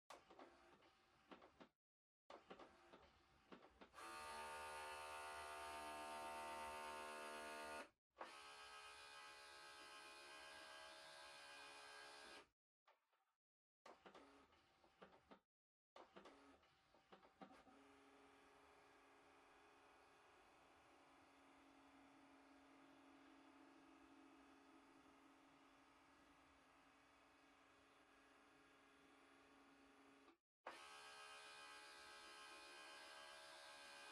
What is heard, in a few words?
Printer Far Scan